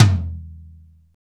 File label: ACU TOM LOW, drum, tom